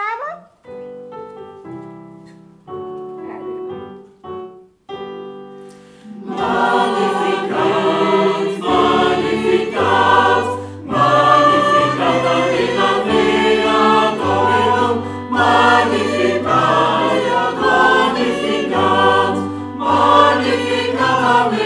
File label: Taize